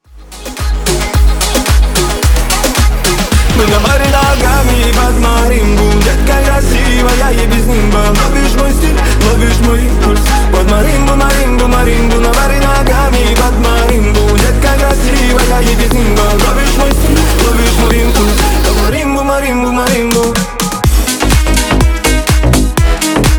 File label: marimba remix